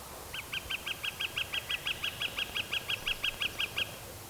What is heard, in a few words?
Northern Flicker; woods; Ariel Foundation Park